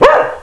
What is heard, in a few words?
Brigand Death; Mythos